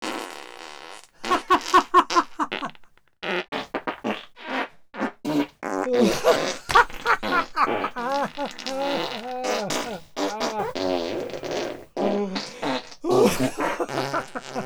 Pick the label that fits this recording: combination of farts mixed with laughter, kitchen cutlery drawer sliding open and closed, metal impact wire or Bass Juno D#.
combination of farts mixed with laughter